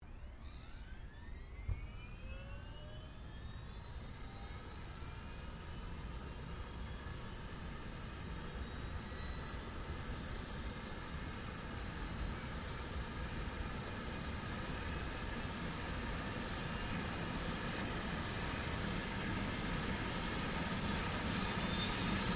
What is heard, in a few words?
HELICOPTER 2004 EUROCOPTER EC130 STARTUP; Helicopters; Vehicles